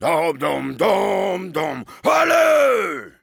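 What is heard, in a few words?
singing, French; Heavy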